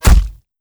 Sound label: bullet hit player; gamerip; shattered horizon 2009